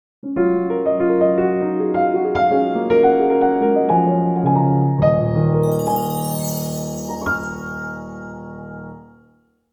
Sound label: zingle piano